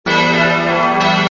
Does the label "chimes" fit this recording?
Yes